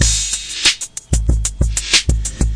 bad 909 rap, drum loops and breaks, 093 bpm; 091 to 100 bpm

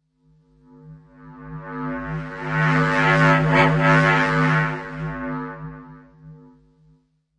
tube resonance flyby; Electro and Synthetic

